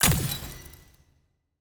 block destruction glass

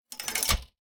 gate down

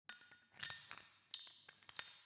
tools

